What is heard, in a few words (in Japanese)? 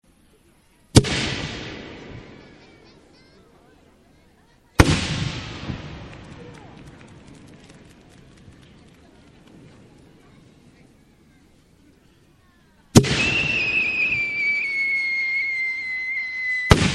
hanabi